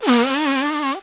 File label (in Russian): nasmork